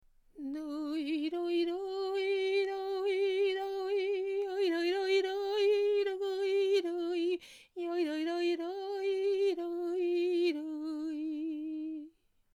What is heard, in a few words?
ashamnu niggun; Niggun Vidui